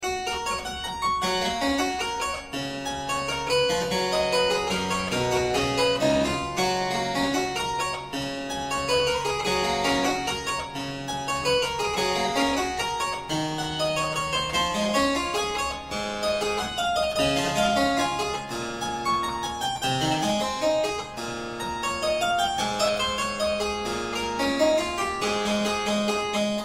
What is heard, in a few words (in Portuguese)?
cravo